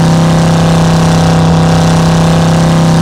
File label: charger mid; charger69; master chris